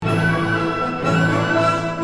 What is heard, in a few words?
Tirilli Motif; 1st movement; symphony1